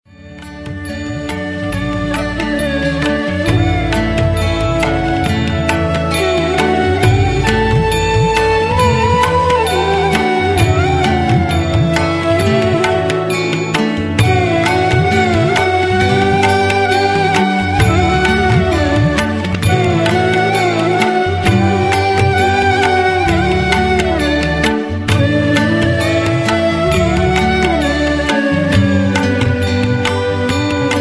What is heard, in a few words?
JAY JAGDISH HARE MUSIC